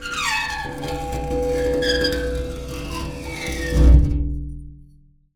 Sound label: Pane Of Glass Rub